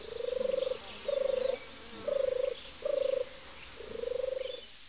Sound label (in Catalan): tortola